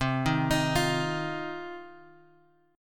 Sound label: C Minor Double Flat 5th, chord; Cmbb5; {X 3 1 X 4 1} arpeggiated